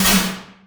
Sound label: snare